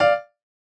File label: chorus chord F6 D6